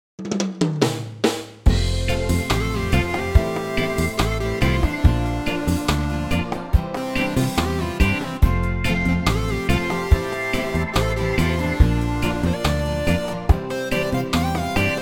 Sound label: F reggae